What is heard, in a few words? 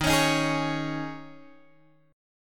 D sharp 7th Suspended 4th D sharp, Guitar Open G